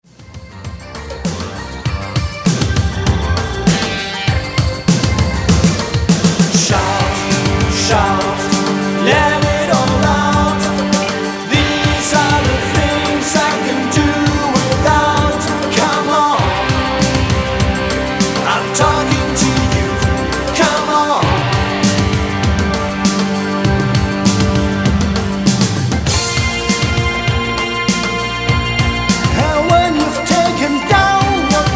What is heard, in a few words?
shout